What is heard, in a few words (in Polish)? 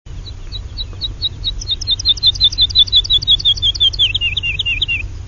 lerka - śpiew godowy w locie; wroblowe